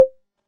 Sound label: click, ui